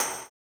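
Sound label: tambourine, 160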